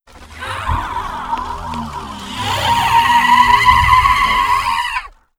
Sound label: dimensional horror death; Stellaris; guardians